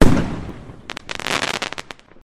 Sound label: firework notification; ringtone